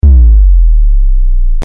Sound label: digital whoosh